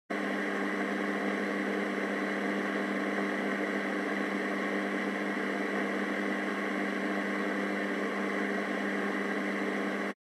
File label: Radio Signal Noise AM